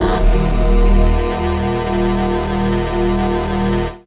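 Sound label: syn d#1, Synclavier; Best Service ProSamples vol.55 - Retro Sampler; FAIRLIGHT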